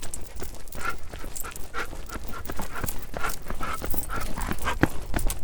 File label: Dog chain